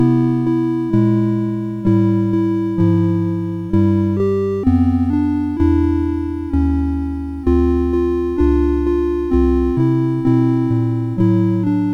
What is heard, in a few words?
Jingle Bells, CSG8580